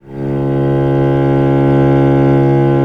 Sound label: C#1, VIOLE, Historical Instruments; USB Soundscan vol.23